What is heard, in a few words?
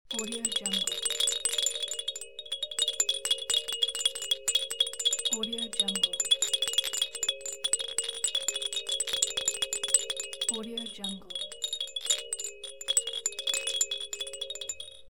wind chime baby toy